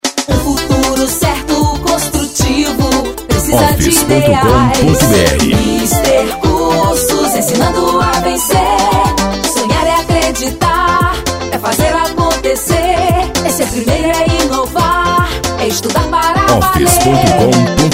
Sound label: jingle